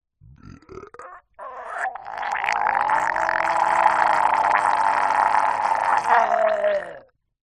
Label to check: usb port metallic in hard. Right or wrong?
Wrong